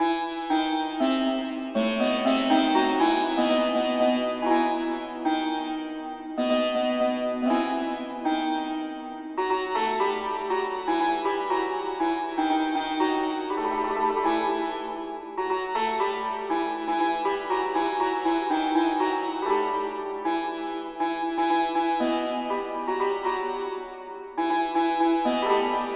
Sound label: santoor